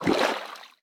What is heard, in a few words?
Floor Sink; boomerang fu